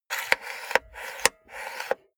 cassette player antenna extend medium

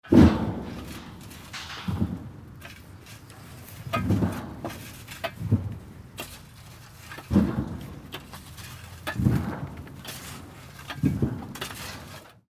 filling the feeding trough

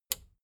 plastic button small latch push

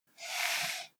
computer mouse draw

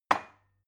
glass put down cupboard

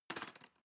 cutlery teaspoon drawer place

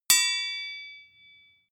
sword hit ring soft

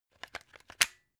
plug unplug clatter

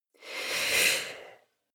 female breath slow in

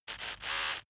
servo overload

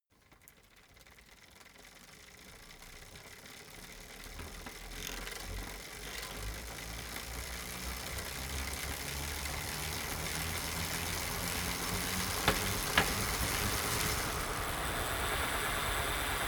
bicycle street drive accelerate high

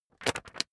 magazine skim bright

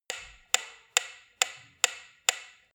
metronome 138 bpm two-two time

